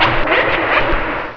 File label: boid alert